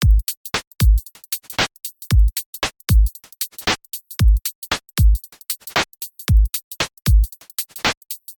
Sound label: Drum Only; Time Break